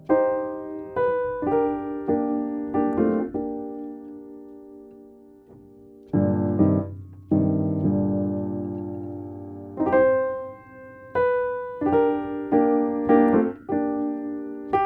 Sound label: Blues